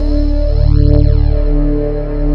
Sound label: ORGAN BASS; Techno Trance